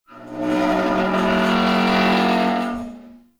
Exterior Tractor Metal Groan; Warframe; Duviri; Ambience

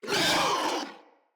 Infested Charger Death, Enemies

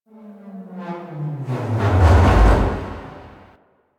Destruction Texture; Warframe; Corpus; Railjack

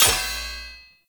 Short Sword Medium Impact Metal Shield

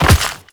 Step Dirt, Footsteps

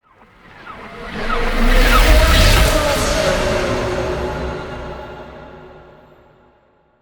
Transmission Start; Eleanor; Warframe; TC Demo

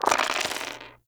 Dice Roll Five; Warframe; Koumei

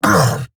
Pain, Battle Barks; Warframe; Eidolon Grineer

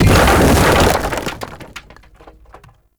Tree Circle Planter Large Destroy; Ambience; Props; Warframe; Nineteen Ninety Nine

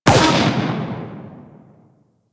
Raptor Mortar Launch; Warframe

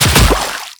Land Water, Footsteps; Warframe; The New War; Characters; Kahl

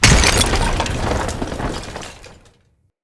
Knights Path Floor Break C; Champion Crypt

